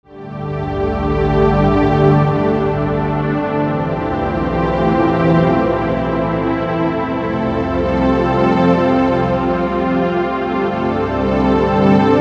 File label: music